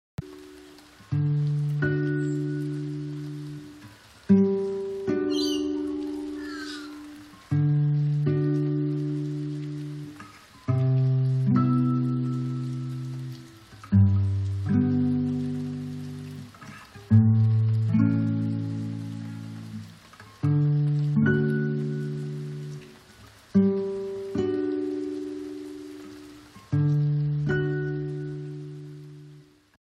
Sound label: menu theme